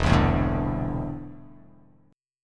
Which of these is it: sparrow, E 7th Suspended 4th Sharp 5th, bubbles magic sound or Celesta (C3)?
E 7th Suspended 4th Sharp 5th